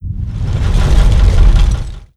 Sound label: 100ton shockwave; Modern Warfare